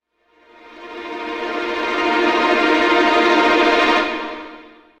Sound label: Music transition - Tense violin tremolo chord; Hollywood Edge Sound Designers Tool Kit